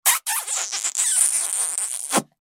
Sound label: Comedy kiss goofy smooch; shorts sound effects